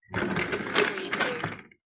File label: Open Drawer With Rattle; Household Sounds / Doors, Windows & Locks